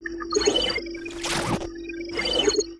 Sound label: guardtower idle; cora